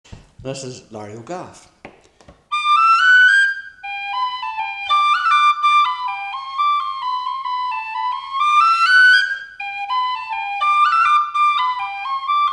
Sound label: larry O'Gaff Whistle